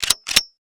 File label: Freeze, Guns